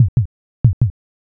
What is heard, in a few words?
heartbeat